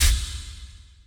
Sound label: Steam Loop End